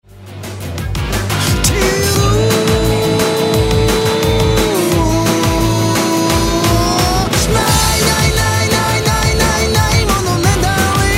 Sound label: music